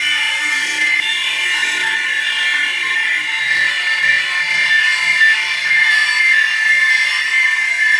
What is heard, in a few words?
Cloudy Surface, Environments; Sonic Foundry (Sony Creative Software); Myths of Technology